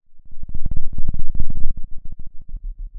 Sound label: pop, Sound of a thunderstorm